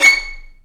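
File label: Vio spiccato C#6; Digital Sound Factory Virtuoso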